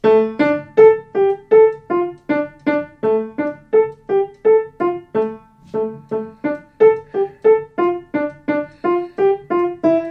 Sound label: piano playing skeleton